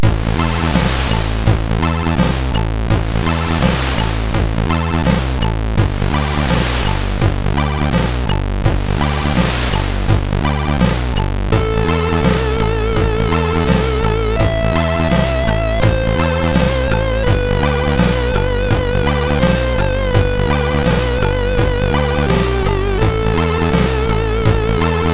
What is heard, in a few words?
Dream Comes True, sid MOS6581R3; hvsc